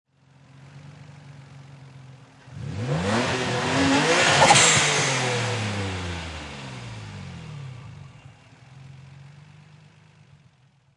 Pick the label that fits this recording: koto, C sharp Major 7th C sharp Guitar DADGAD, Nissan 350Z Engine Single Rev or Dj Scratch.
Nissan 350Z Engine Single Rev